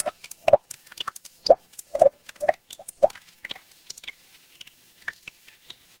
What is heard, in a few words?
water drip